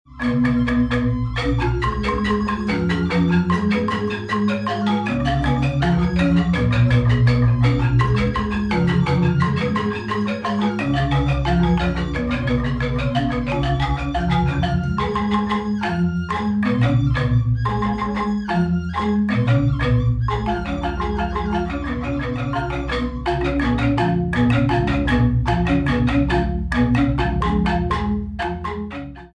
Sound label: tabuh tari, sekejegog tingklik